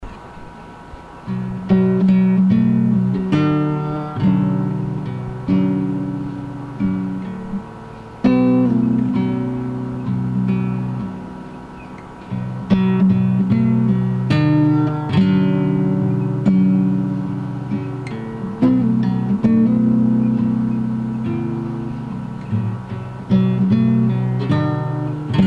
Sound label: Guitar